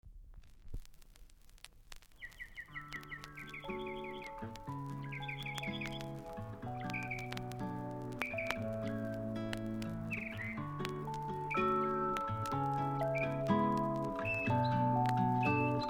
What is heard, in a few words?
Music With Birds